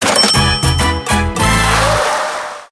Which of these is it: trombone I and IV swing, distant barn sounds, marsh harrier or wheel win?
wheel win